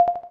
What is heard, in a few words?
Xylophone